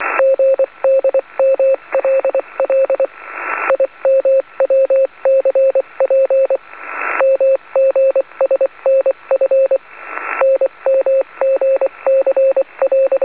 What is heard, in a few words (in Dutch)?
CW in USB niet mee te lezen; 4.440